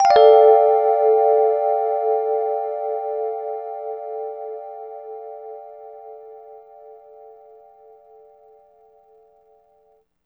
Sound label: chords